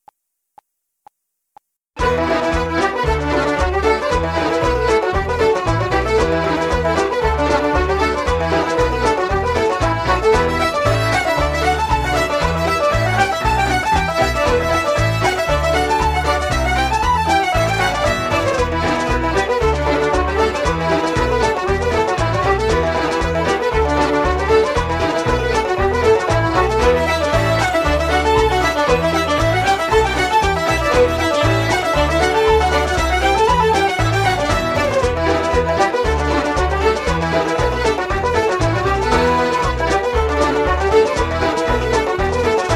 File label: Irish Ceili Reels Medley Em, backing track